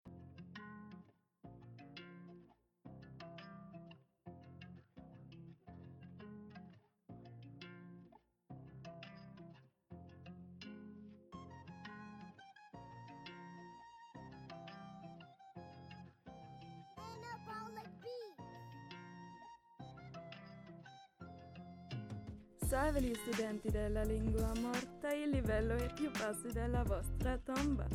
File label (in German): Italienisch Rap